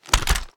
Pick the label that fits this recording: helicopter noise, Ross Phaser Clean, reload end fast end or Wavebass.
reload end fast end